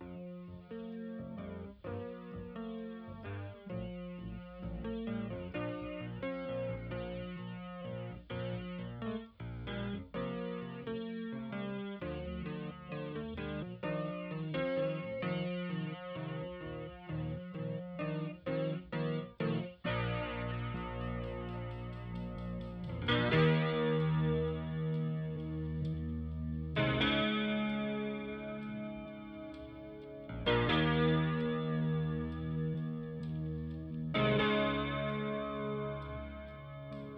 Piano Main

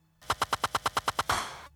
pumping; 5A; 135.82; Pumping Kork

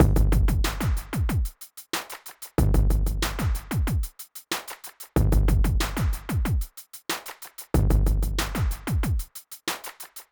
Drums

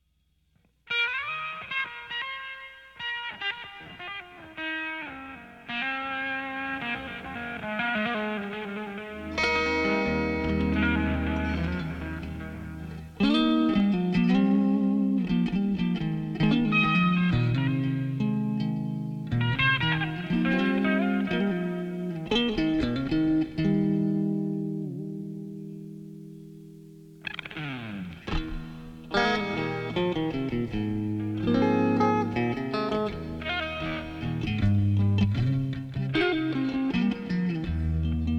guitar

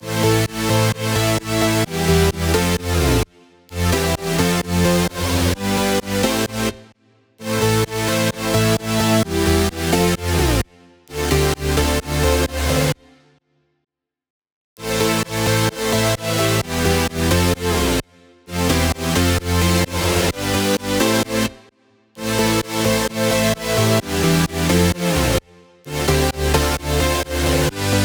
Drop Chords

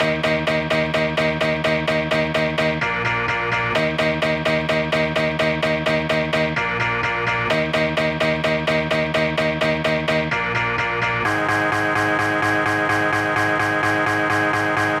Guitars

